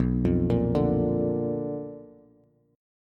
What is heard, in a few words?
B-Minor Major 7th Flat 5th B down Bass; BmM7b5 arpeggiated; JGuitar